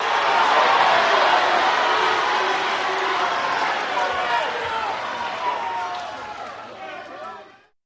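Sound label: Crowd Cheers Short Burst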